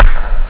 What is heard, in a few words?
bdrum; Amiga Soundtracker Sample Packs